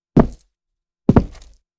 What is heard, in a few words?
Heavy boots walking